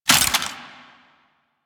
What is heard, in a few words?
Reload, Rifle; Weapons; Warframe; Thanotech; Trumna Prime